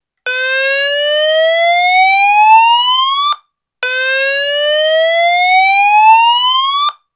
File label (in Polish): ton